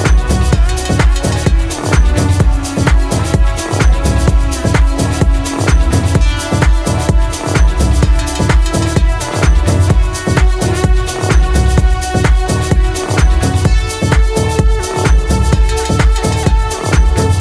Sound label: A Long Walk Inst